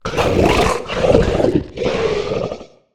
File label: Humanoid Throat, Monster Sounds; Monster Sounds Volume II